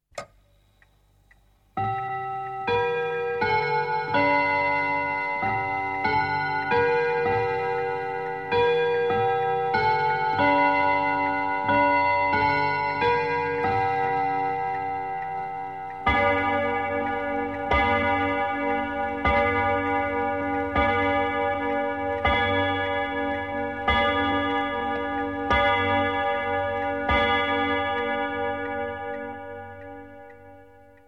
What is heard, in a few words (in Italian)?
BIGBEN OROLOGIO A PENDOLO